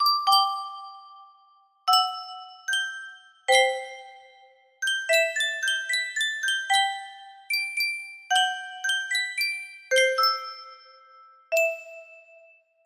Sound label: Yunsheng custom tune music box